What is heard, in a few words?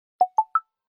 tecno gentle